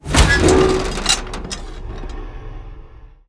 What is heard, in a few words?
tube latch; trek